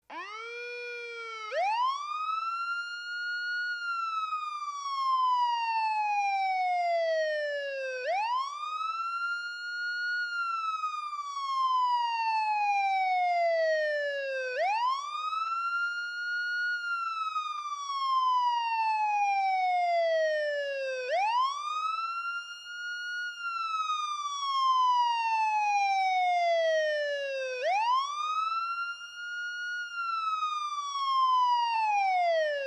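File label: Ambulance Wailer Siren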